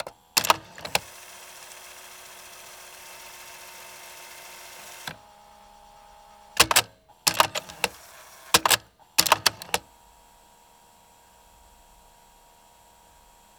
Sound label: ambience data